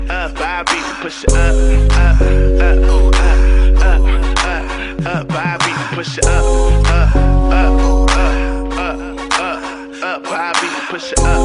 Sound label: up beat; rnb